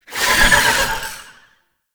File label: Shield Drone Projectile; Enemies; Abilities; Warframe; Tau; Dax Medic